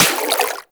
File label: Water Sprayer Beam Impact Flesh; Warframe; Weapons